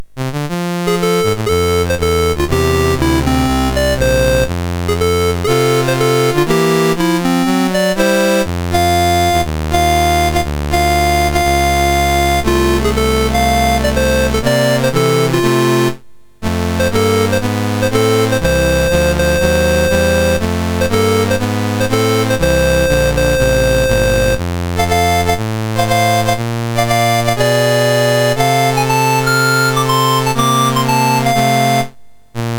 Blues Stomp, MOS6581R4

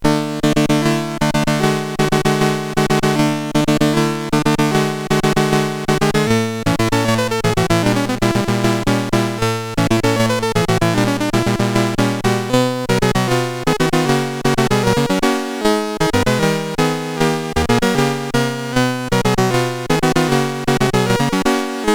Fanfare, CSG8580R5; Master Composer

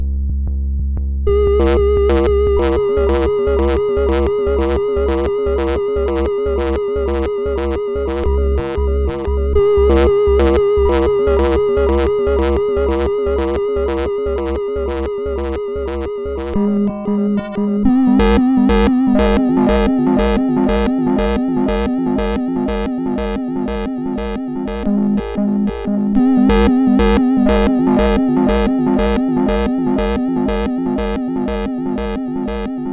sid, MOS6581R4; Cracked by Laika

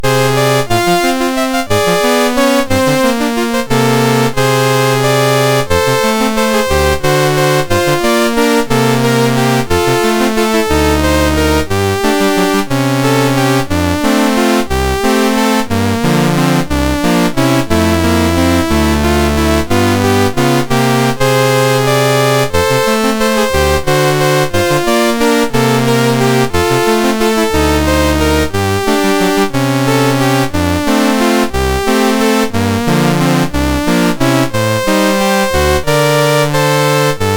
Christmas Waltz, MOS6581

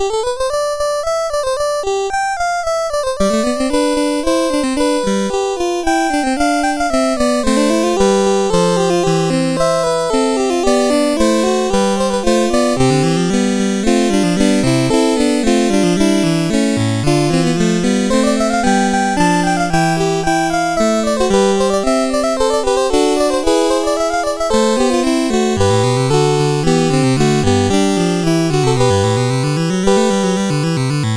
Canzon, CSG8580R5

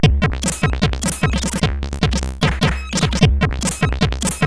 Break, sid CSG8580R5

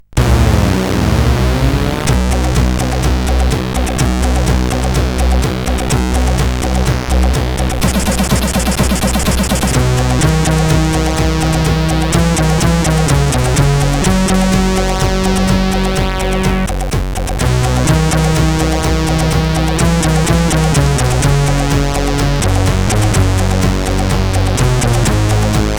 Intro, sid CSG8580R5; Dynamix Intro 2

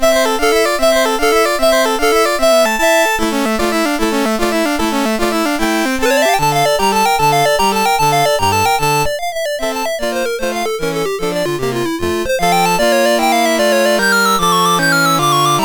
Tarantella, MOS6581R2; Dave Marquis

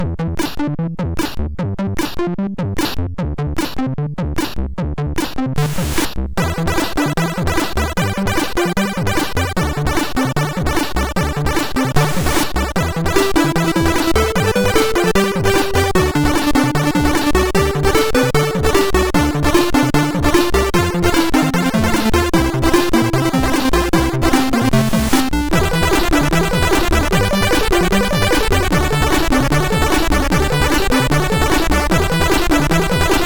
Train Jams, MOS6581R4

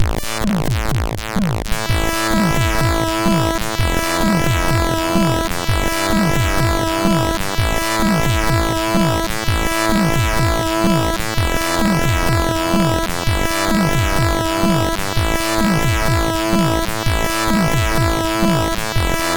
Music, MOS6581R4

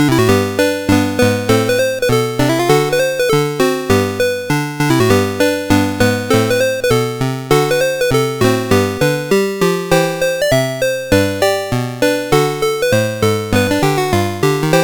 sid CSG8580R5, GAMES; Donald Ducks Playground

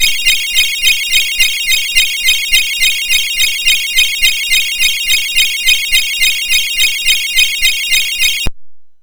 Sleigh Bell Sounds, MOS6581R2; NWACUA Christmas

